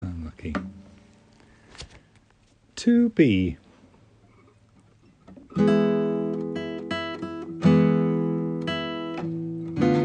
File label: rhythm